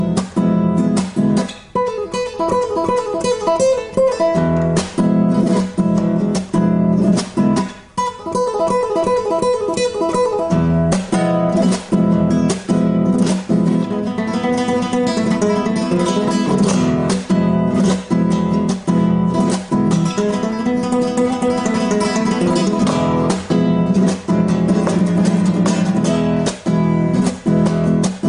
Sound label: Flamenco Guitar